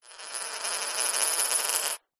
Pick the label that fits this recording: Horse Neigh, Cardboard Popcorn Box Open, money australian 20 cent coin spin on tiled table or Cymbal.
money australian 20 cent coin spin on tiled table